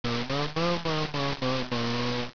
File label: moo